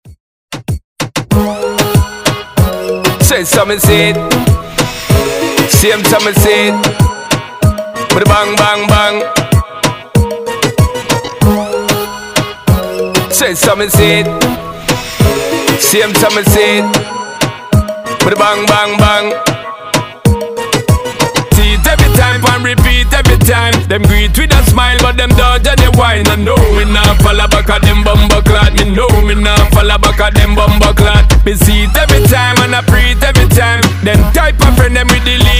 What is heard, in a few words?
Moombahton; Redrum